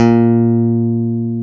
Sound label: A#1, PICK BASS; AKAI S-Series CD-ROM Sound Library VOL-7